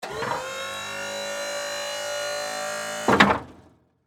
Servo Motor, Snow, Plow, Movement; Vehicles, Commercial

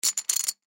Poker Chips Stack, Poker Chips Stack Short; Casino; Sports

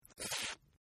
Slurp Female, Drinking; Sound Effects:Human; Partners In Rhyme

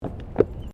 Tram Horse Drawn Step Wooden Bridge; Horse drawn tramcar; Carriage Sound Effects; Carriages (Sound Effects:Vehicles)

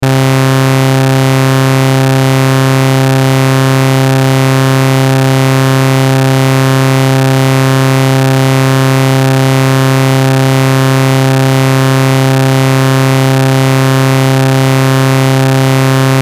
Noise Saw Wave Buzz Hum Electrical Pulse; Blastwave

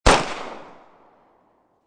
M9 9mm Field, Pistol; Field Fire; Pistols; Guns; VRSonic

